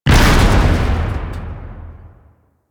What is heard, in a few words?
Street Barrel Explosion; Warframe; Nineteen Ninety Nine